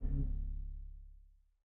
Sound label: lower oneshot hoover a